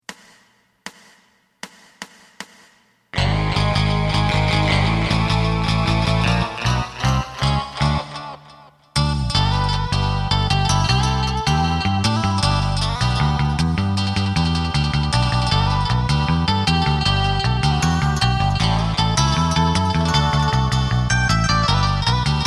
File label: drum